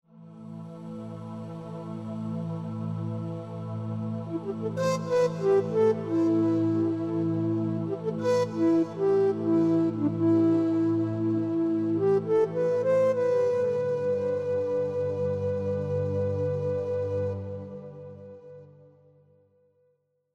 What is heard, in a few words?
Dark Ritual